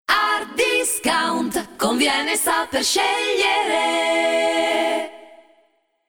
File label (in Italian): solo voci